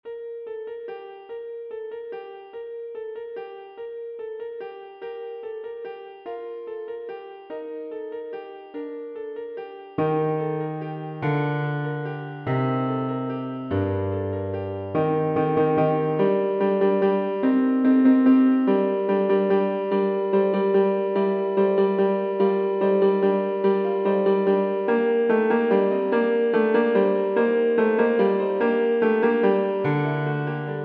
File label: Bass